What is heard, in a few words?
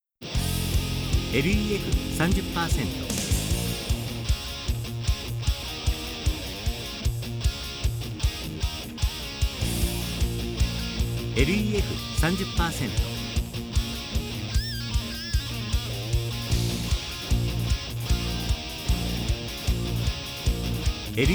rock